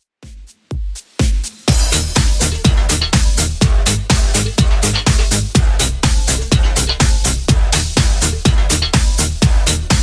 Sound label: 80s Redrum 124bpm